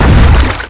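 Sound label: explode water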